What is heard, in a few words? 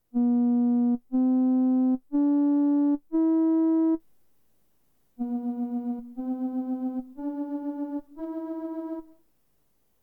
Rotary Speaker